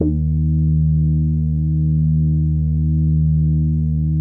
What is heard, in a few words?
BIT BASS EB, CRUMAR BIT01, Vintage Keyboards; Big Fish Audio - First Call Vintage Keyboards